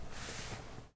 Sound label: Paper Swipe Surface Short